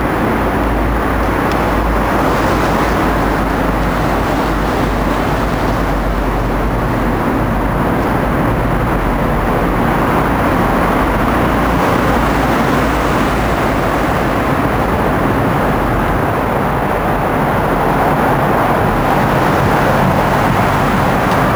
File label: Traffic tunnel footsteps